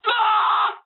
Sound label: jp aircraft destroyed scream, speech jp